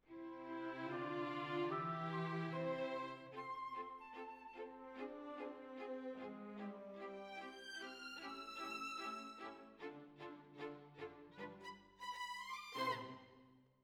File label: Violins Over